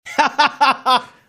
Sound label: short laugh